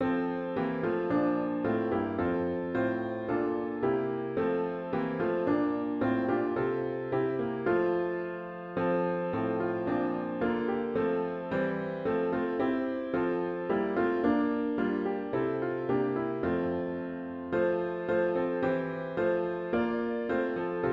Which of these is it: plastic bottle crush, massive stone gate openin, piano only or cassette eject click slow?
piano only